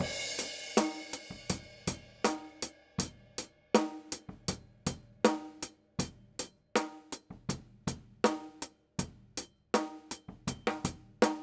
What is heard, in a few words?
Hihat